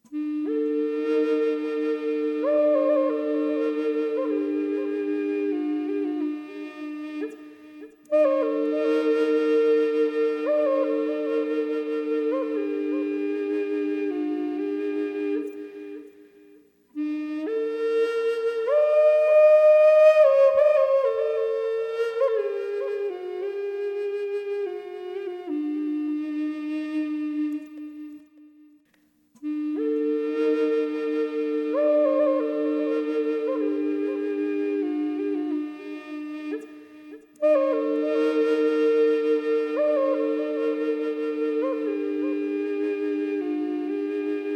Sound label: Eb with Reverb, drone flute